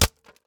plastic hit; teardown ps5 windows xbox series xs gamerip 2022

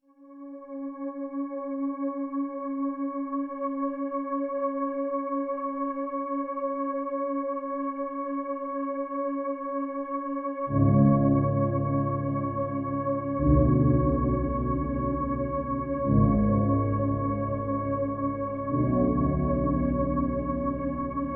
Anxious Ambience; I Wani Hug That Gator

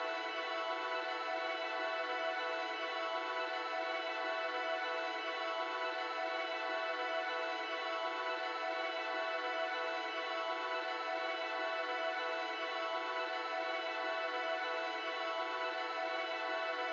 Bubble pad loop; Soulless: Ray of Hope

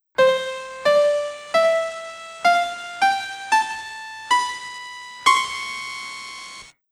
Piano Lesson; Tales of Phantasia 20th Anniversary Sound Box; SFC

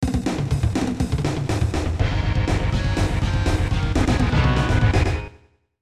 Game Start; J.League 96 Dream Stadium; SNES

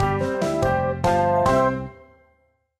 Level Up; Restaurant Story 2

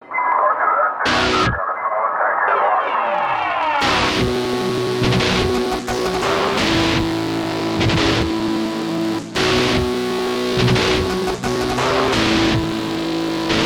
Ambient Loop; Transformers Revenge of the Fallen; PS3 Windows Xbox 360 gamerip 2009

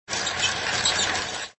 Pedal

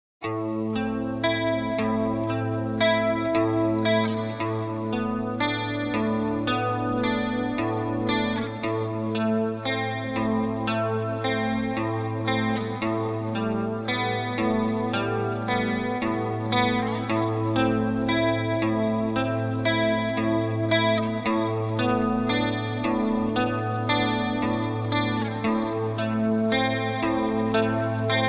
Space Rock

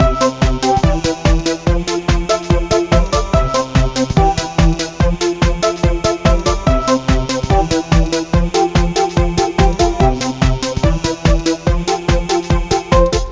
Dance; Talking Pato; Android iOS

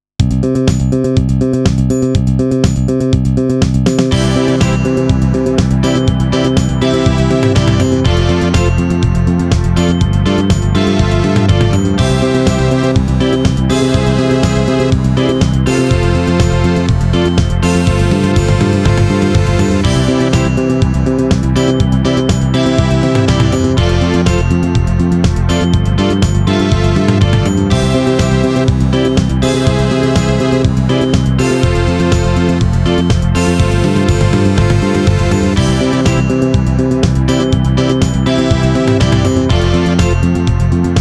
Stage Clear; Cotton 2; Cotton Boomerang

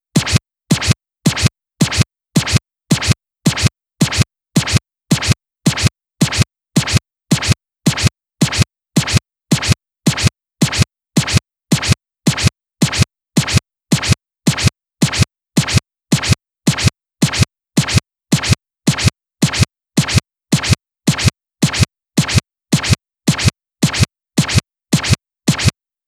Disc Scratch; Inkopolis News

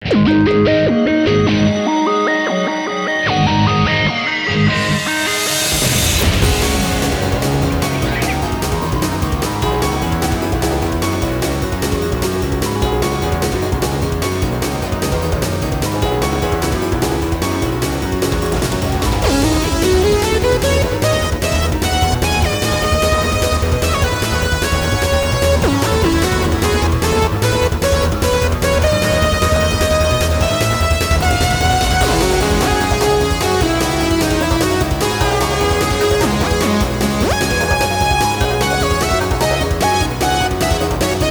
Aquarium; Bloody Roar 4

